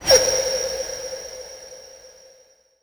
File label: FX Scratching; Vengeance Samples